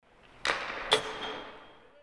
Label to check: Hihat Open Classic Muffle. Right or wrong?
Wrong